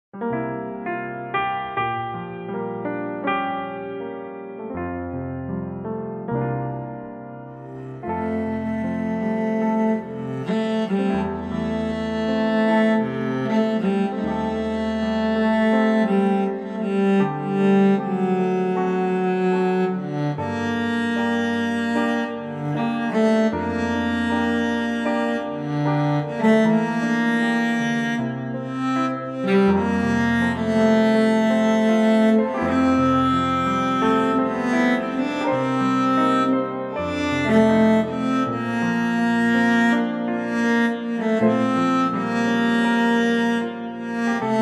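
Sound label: F play cello reference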